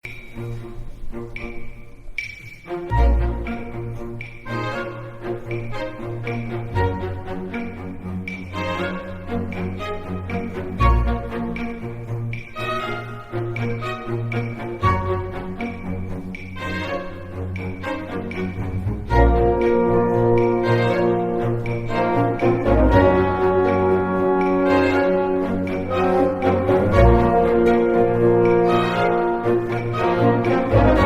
questioning

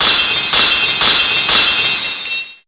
lock break; PsycheLock

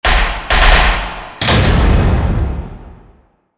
class trial start

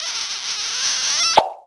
kiss

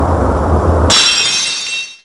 black lock break; PsycheLock

